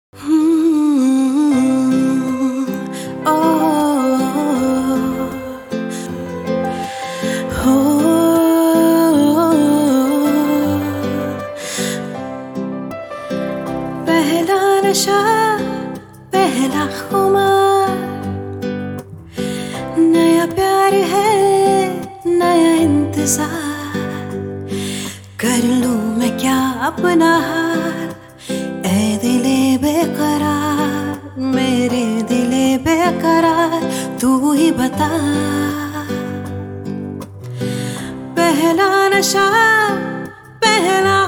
acoustic

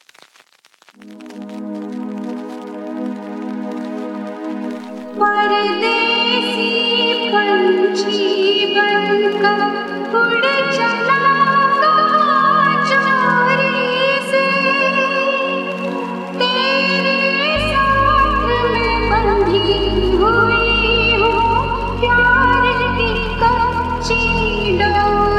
sad lofi flip

